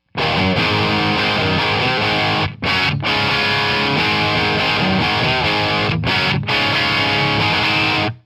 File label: Ace Tone 2x12 Celestion; Rock